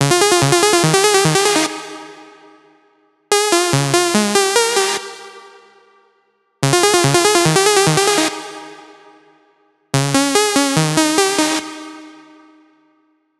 Arp, 145BPM

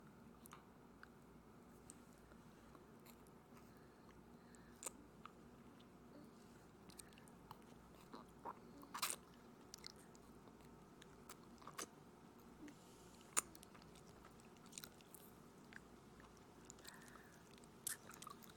binaural asmr mouth noises

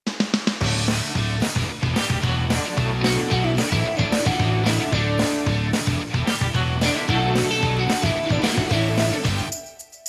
Ending (Instrumental); My Little Pony OST Repository; Season 1; Bonus Music

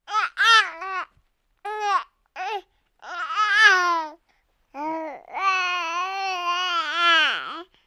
Baby Idle; People

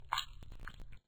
Suck; Lewd SFX

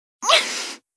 VOX Get Hit; Li Li; Heroes of the Storm; warcraft

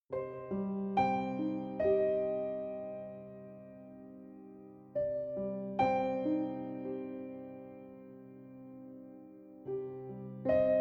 Music

